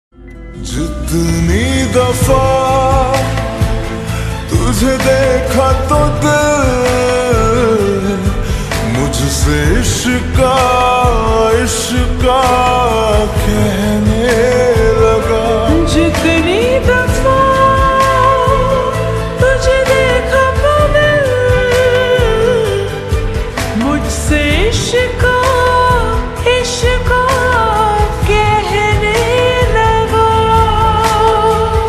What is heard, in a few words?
Slowed Reverb Ringtone